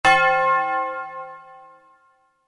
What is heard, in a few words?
Large Bell Ring, Note Of C; Musical; Bells; Stuart Duffield